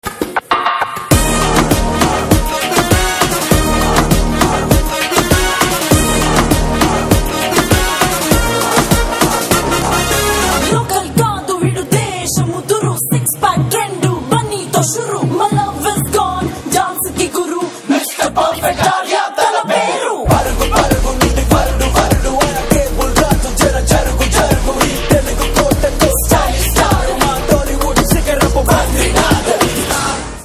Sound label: RAP Song BGM, Telugu